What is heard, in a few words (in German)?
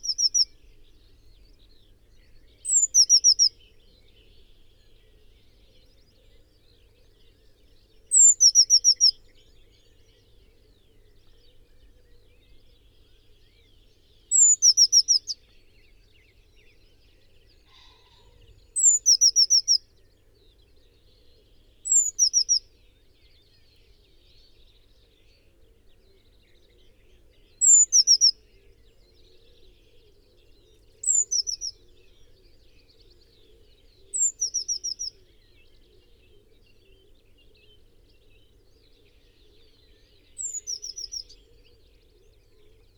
Blaumeise